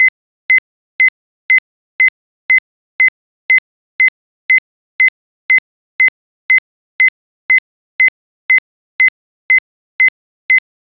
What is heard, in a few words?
short bleep in a countdown timer